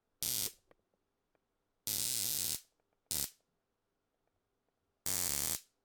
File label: Taser